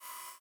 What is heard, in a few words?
hud photo focus